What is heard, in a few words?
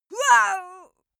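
female death, screams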